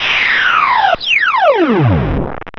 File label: laser